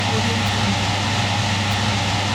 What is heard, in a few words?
scifi ventilation system loop